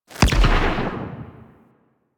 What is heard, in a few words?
Operator Void Melee Punch; Warframe; Focus; Powers